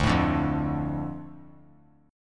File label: D Minor D, chord; Dm strummed; JGuitar